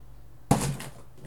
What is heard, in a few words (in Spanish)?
Ruptura De Arbol